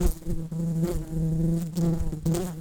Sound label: Pascuorum workers, bumblebees